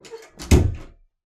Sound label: Door Bedroom Close